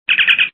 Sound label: communicator